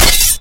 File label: melee katana; weapons